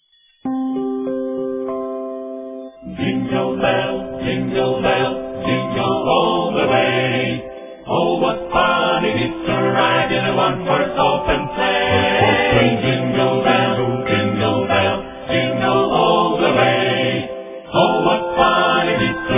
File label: jingle bells